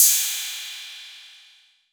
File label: PERC 808